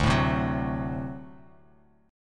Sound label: D 7th, down; D7 strummed; JGuitar